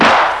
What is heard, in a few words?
SLAP; ENOMERCY; noyz